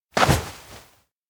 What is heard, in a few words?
raise hand big; rikka; anemoi